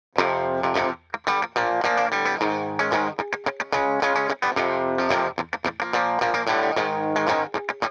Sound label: Guitar Intro